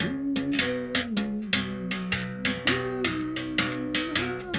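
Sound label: 79 bass berimbau phrase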